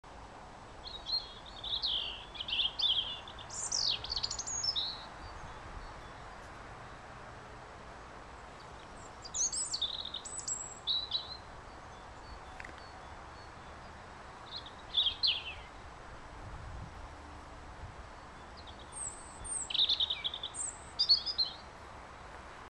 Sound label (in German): Rotkehlchen zwitschernd